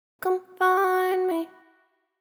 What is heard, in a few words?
autotune